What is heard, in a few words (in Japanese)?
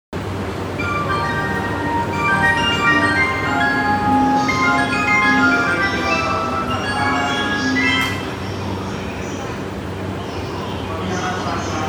JR 山手線 渋谷